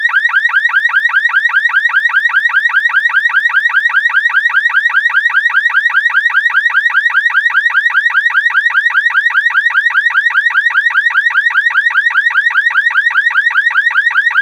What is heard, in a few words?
car alarm; gmod; perp2.5